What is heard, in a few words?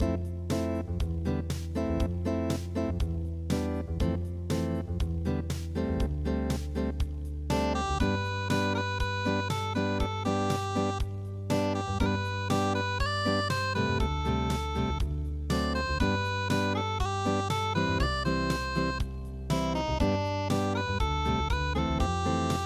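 red river valley unplugged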